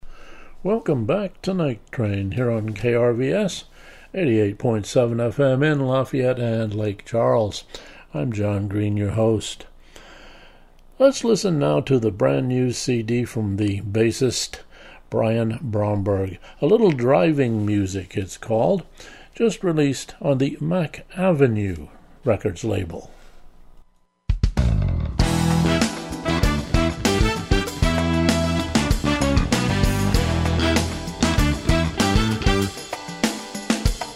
jazz

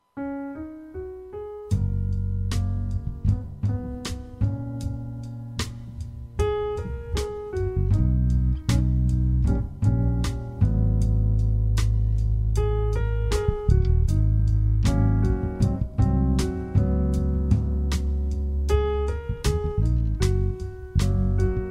jazz

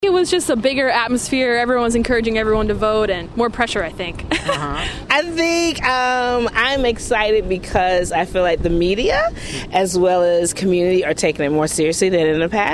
voter atmosphere

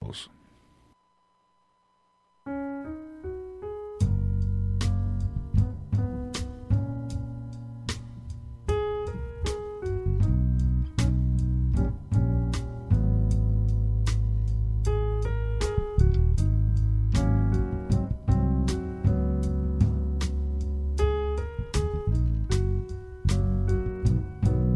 jazz

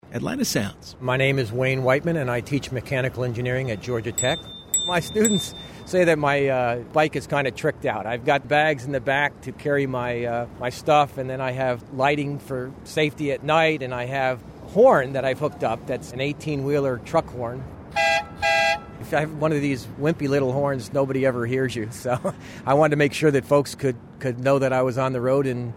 Bikers Truck Horn

